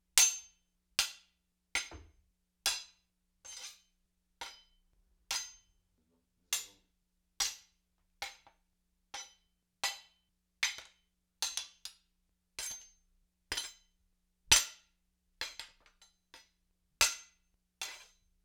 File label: Spear Sabre Blade on Blade, Weapon on Weapon; StillNorthMedia